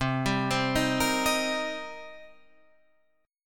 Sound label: Eb 6th C, guitar